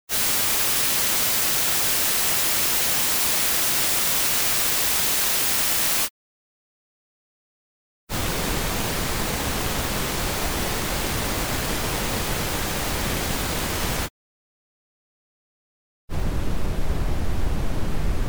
geraeusche

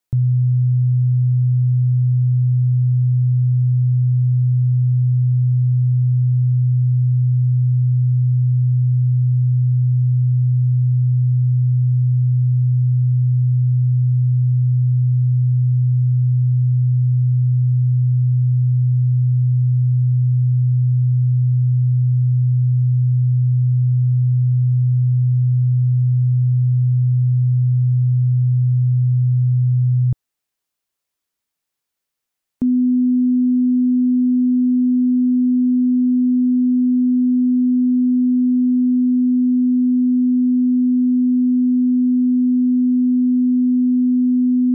stehende wellen

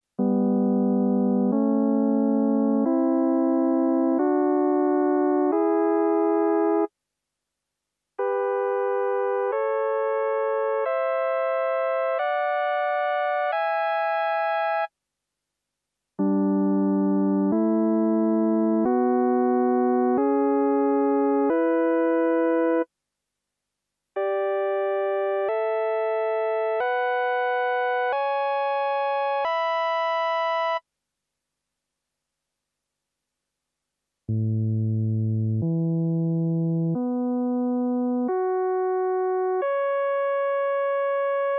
reine Stimmung

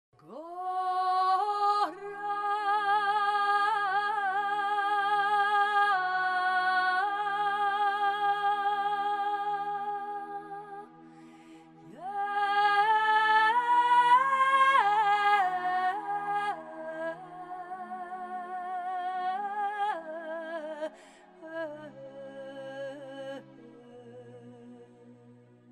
flanger effekt

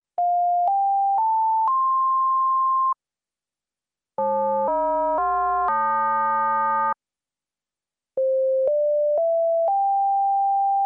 ringmodulator

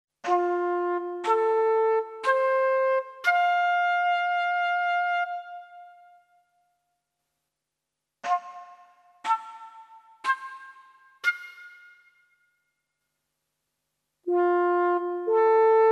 digitaler synthesizer mischform

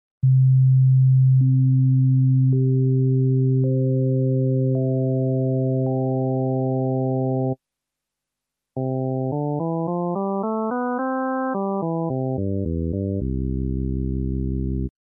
klang

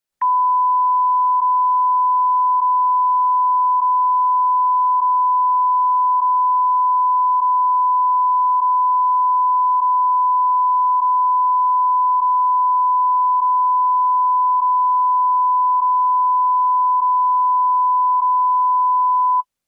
mikrointervalle

